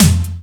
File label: ELECT SD; USB Soundscan vol.27; Ragga & Dub Reggae; AKAI